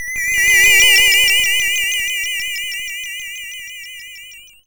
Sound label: YM Arp B; chip hits; arps; CHIPSHOP DELUXE